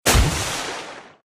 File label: battle sink